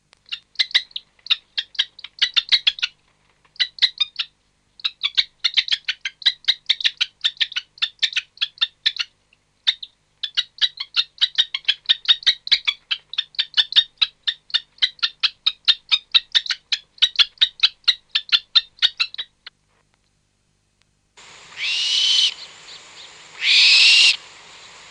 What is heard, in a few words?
kite black-shouldered, bird calls